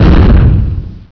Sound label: explosion; hurrican